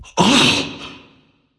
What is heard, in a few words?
nv breath; smite; voicepack; xbalanque